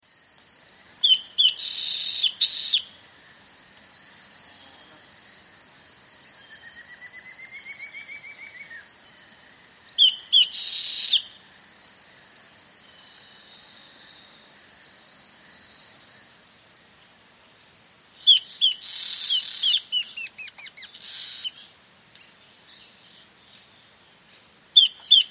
Long-Tailed Antbird; S. America

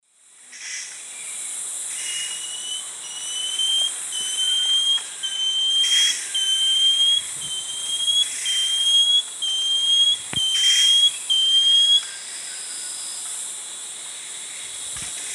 Dichrozona cincta, song; S. America; Eastern Lowlands

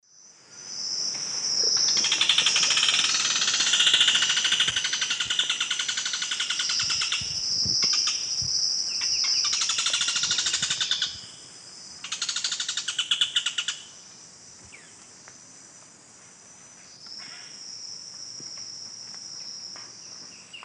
Piaya cayana, call; S. America; Eastern Lowlands

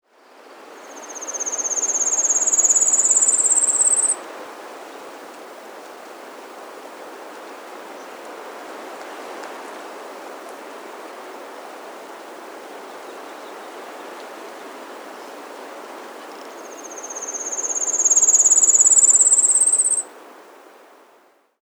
Brown-Capped Redstart; Myioborus Bru; S. America

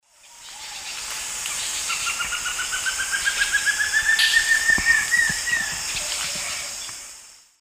Rhytipterna simplex, song; S. America; Eastern Lowlands

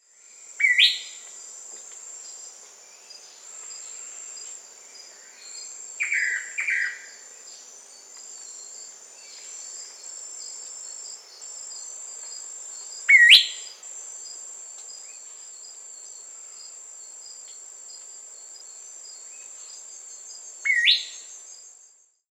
Blue-backed Manakin (Chiroxiphia pareola), Brazil; S. America

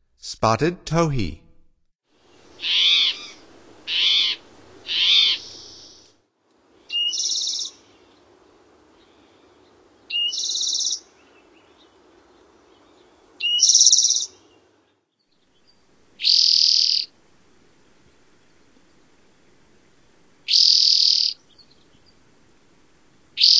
Spotted Towhee, Songs of Birds of North America; Stokes

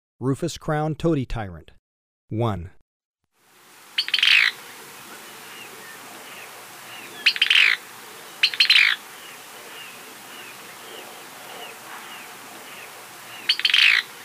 Rufous-crowned Tody-Flycatcher, Birds of Ecuador; S. America

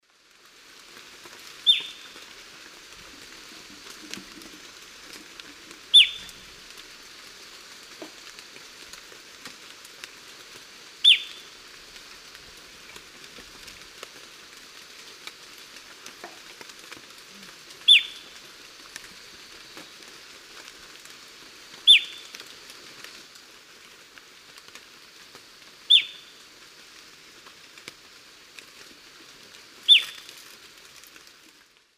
Formicarius colma, call; S. America; Eastern Lowlands

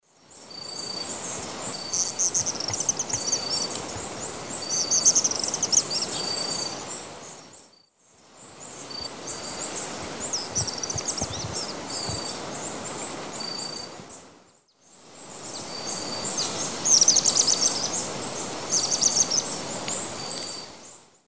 Panyptila cayennensis, calls; S. America; Eastern Lowlands

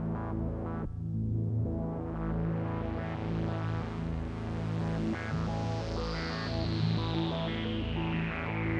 SCI-FI

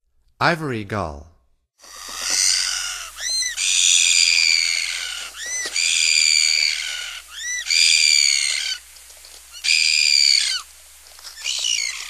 Ivory Gull; North America; Stokes

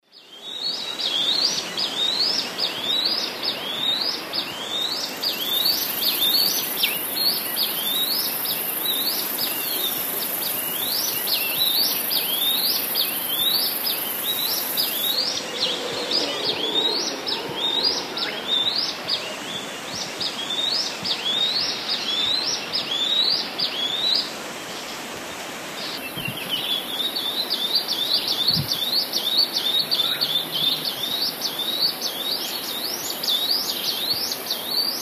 Legatus leucophaius, calls pair; S. America; Eastern Lowlands

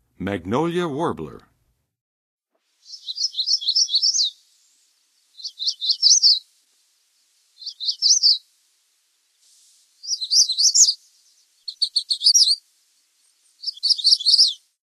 Magnolia Warbler, Western USA Songbirds; North America

